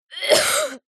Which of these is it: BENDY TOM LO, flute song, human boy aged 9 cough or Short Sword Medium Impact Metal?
human boy aged 9 cough